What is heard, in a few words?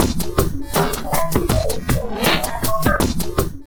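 drum loops crazy processed, 80 bpm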